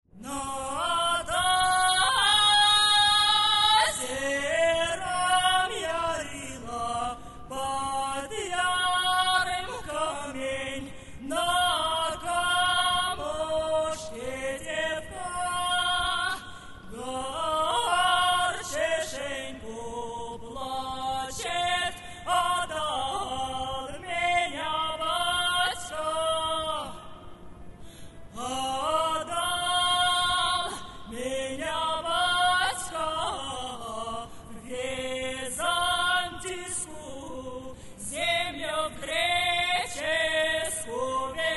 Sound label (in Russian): russkaja narodnaja pesnja